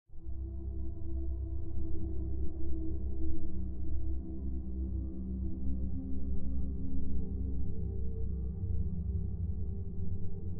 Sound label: CULT ORGAN; Barotrauma; Barotraumatic; Cthulhu